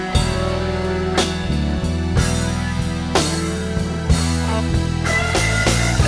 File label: blues mix